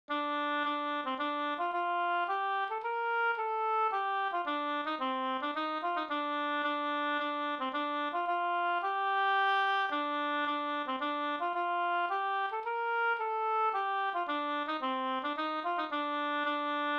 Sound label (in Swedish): hamburska g moll for sang